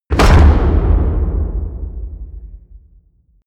hit wood, hits impacts

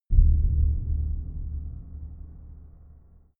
far outer space shadow, hits impacts

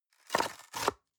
box small tear apart hard; industrie wirtschaft; bueroarbeit

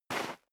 step sneaker gravel light slide short

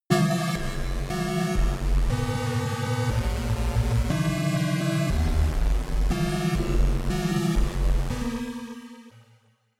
danger synth, sciene-fiction

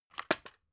drug package drop hard; berufe; arzt

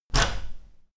door key in out

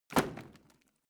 wood bing drop; berufe; foerster

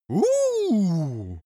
male cheer mildly; soundpool

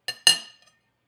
cutlery on plate clatter and scrape, gastronomie; berufe; soundpool

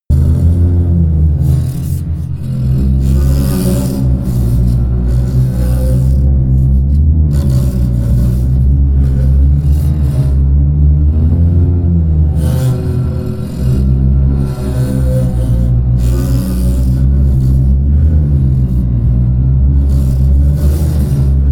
drone erratic didgeridoo low

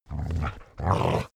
border collie snarl; natur-tiere; hunde

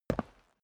step boots concrete single right; menschen; schritte

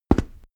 step stone walk

